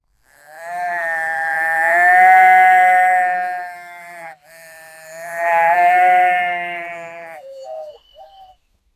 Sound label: cow sound high volume